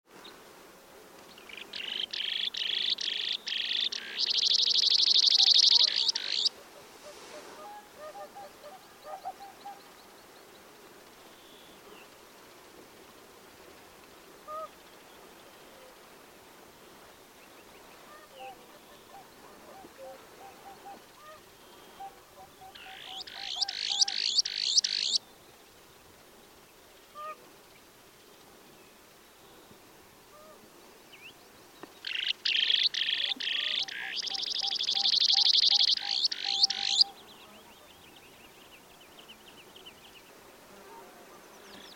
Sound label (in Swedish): Myrsnappa